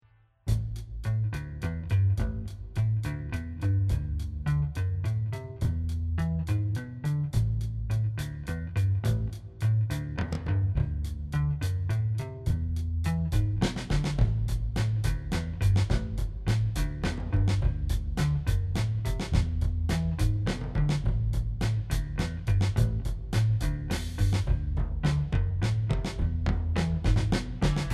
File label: tasty riff